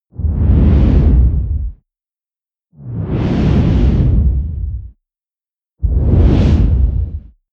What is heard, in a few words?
whoosh deep squall heavy short